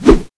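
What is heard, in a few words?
claw slash, zombie; zm mod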